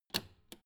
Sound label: old button simple press